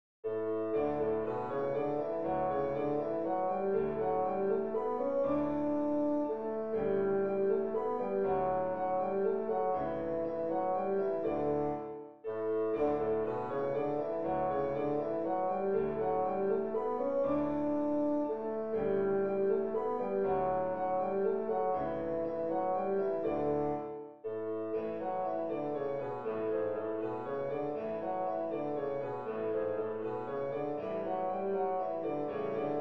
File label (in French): danse allemande; beethoven ludwig van